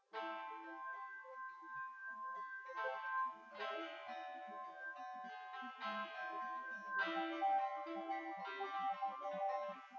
nodrums synthconv 250ms 5cm src

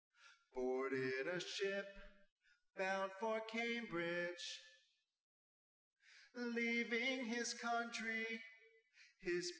nodrums inst sim

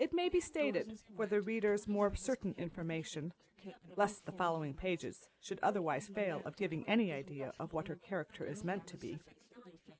female inst src

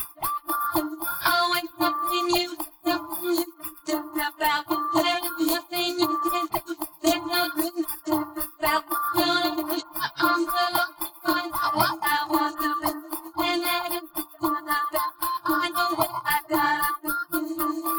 vocals; SiSEC11

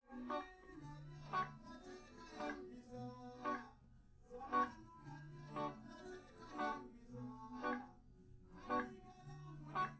no drums live rec

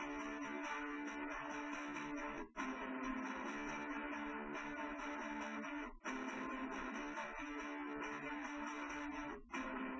test w drums live rec, underdetermined; SiSEC10

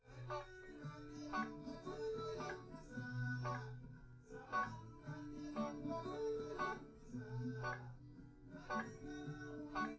nodrums liverec; underdetermined; SiSEC11

